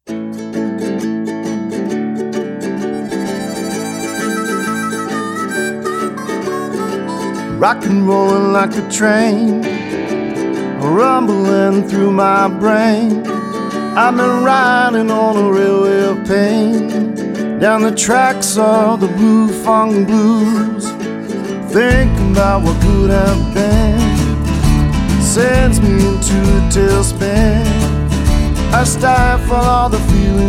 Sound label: Blue Funk Blues